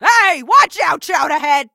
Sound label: hurt vo